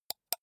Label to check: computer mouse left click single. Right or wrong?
Right